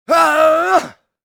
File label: attack voice; UFC Tapout; XBOX